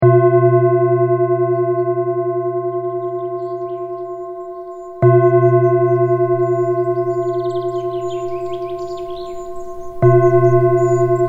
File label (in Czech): Koherentni dychani zvuk les